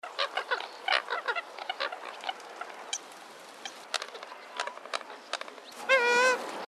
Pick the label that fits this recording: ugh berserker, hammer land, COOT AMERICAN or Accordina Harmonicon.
COOT AMERICAN